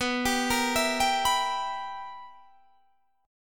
B Major 7th Suspended 4th Sharp 5th Guitar Standard, chord; BM7sus4#5; arpeggiated; 19,22,20,21,20,19